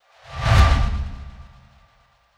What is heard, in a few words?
Simple Transition